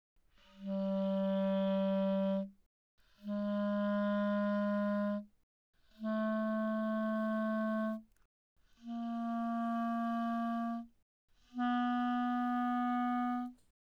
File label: Eb Clar mf G3 B3, Eb clarinet, woodwinds